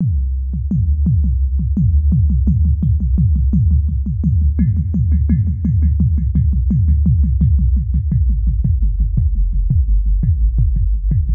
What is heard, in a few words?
Slower Tempo